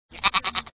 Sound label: bleating; monsters university